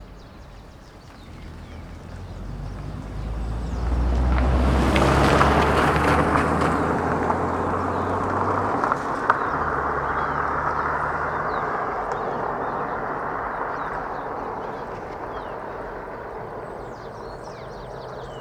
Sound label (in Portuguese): Carro passando velocidade alta passaros; alto paraiso de goias